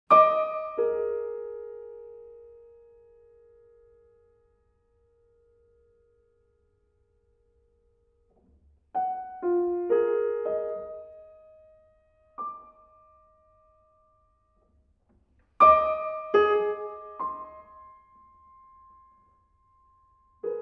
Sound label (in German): Klavierstuecke